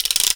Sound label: weapons